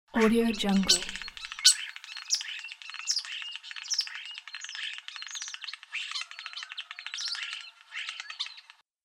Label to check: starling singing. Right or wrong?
Right